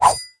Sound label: sword attack; matmos interactions